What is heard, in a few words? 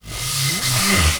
clean Toilet Loop; Singles Flirt Up Your Life